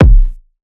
kick large; Drum Sample Pack; One Shots; Slate; SEISMIC

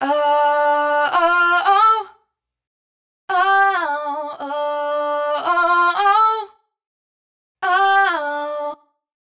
riff Uh Woah Woah 110 Dm wet, Vocal; Slate Digital Omnivox Vocal Sample Pack

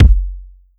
Kick, 909 Kicks

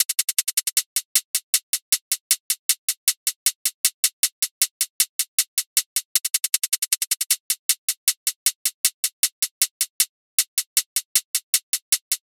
hats 156, Hat Loops; drum sample pack; Krafla; SEISMIC; Slate

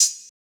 hat, Open Hat; Hip-Hop; Drum One Shots; bags; SD CAICOS Hip-Hop Sample Pack; Slate

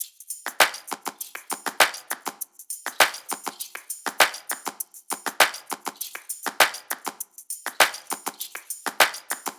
top loop, Drums, Loops, Top Loops, Pop

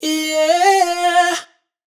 riff yeah Cm wet, Vocal; Slate Digital Omnivox Vocal Sample Pack

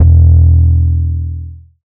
808 thicc E, Tonal; Hip-Hop; Slate; CAICOS Hip-Hop Sample Pack

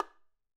bongos Single Hit Mute; raw perc one shots; SEISMIC Drum Sample Pack; Slate

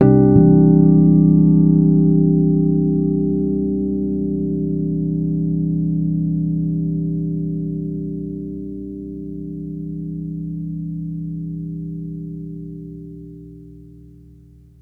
Bass one shot Harmonic Chord C, Electric Bass; Slate Digital BASSHOLE Sample Pack

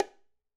bongos Single Hit Left Hand; raw perc one shots; SEISMIC Drum Sample Pack; Slate

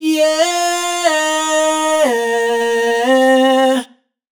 riff Yeah 125 Fm wet, Vocal; Slate Digital Omnivox Vocal Sample Pack

